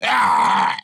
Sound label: Fire Archer Death